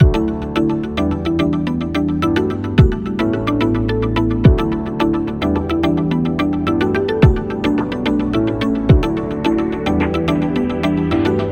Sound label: Informative Music